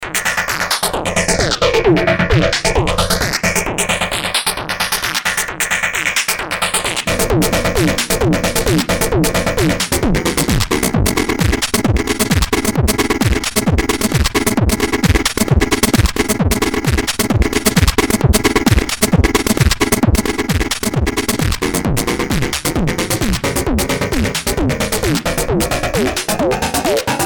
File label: drumloop jam